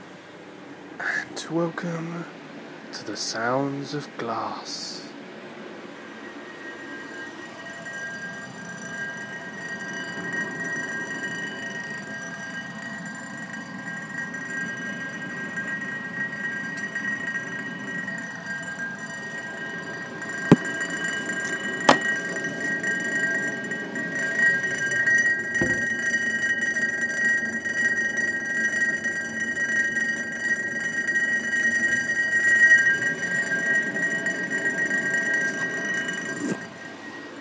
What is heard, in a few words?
glass orchestra